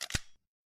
Lock; Oppo; Find 3 X905

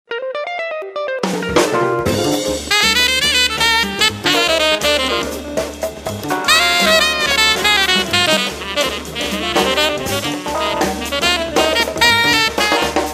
Jazz bar

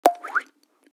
Ringtone

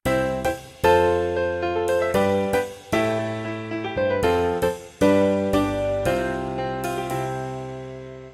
piano, ringtones; Asus; Zenfone-3-Deluxe; Sunshine Piano

